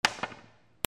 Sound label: BILLIARD